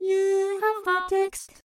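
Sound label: Vocaloid Notification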